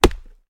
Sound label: mining wood; factorio; Factorio 0.14.19